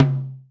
tom drum single hit c key, Royality free tom drum tuned to the C note. Loudest frequency: 376Hz